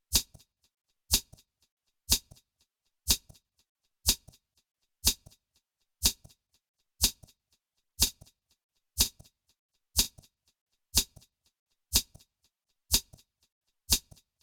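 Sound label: Shaker, D minor, 122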